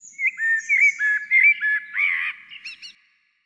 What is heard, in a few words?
Forest bird; ImpGal2; colony